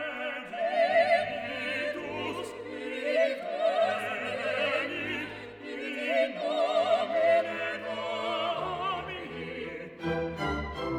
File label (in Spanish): dueto